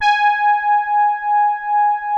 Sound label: POLY SOFT G#5, SOFT BRASS; Music Store - Claudius Diemeter Schwerlos